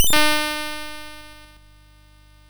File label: sid MOS6581R4, GAMES; Chinese Juggler